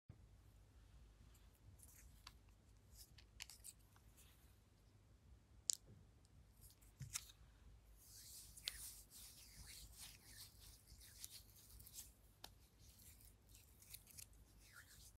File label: hand cream; کرم دست